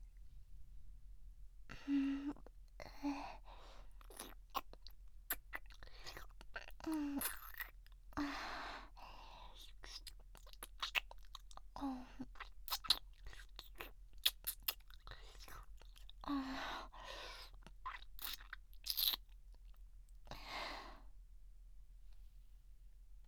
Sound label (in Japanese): 指をなめる